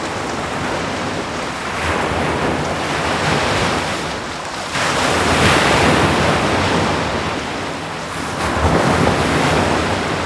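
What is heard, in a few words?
waves coast loop; ambient soundscapes; dota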